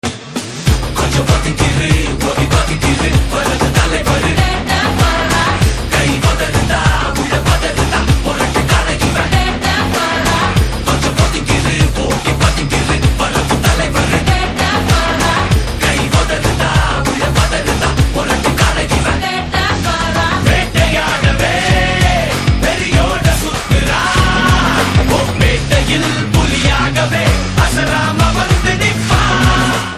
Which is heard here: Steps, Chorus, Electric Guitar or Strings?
Chorus